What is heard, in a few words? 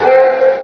Vox